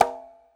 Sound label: reels stop; Beauty Fruity; wazdan